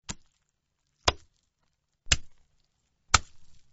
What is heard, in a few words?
slappin